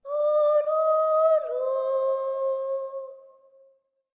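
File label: BOY phrase, Symphony of Voices; Spectrasonics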